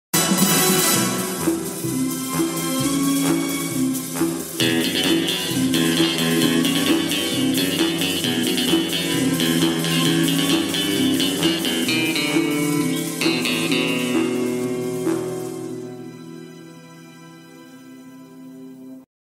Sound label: ringtone; james bond